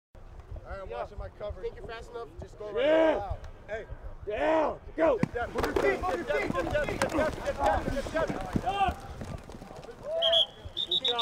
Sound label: Football Practice Huddle Drills